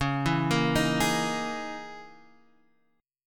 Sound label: Db Minor 6th Add 9th Db down Guitar Half Step Down